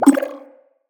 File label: Thank You Bubble, UI; Blessing Device Menu